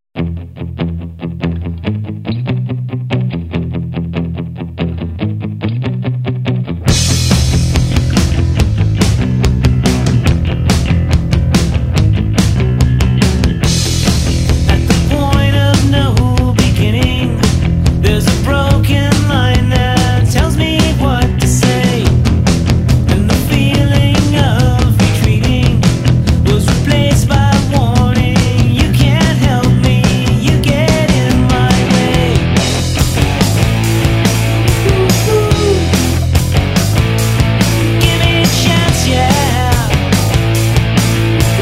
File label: spin strat